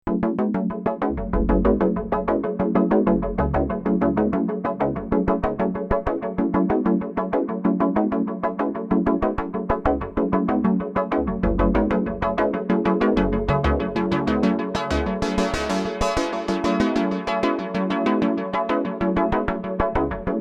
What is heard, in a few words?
Chords